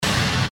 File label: Electric Jolt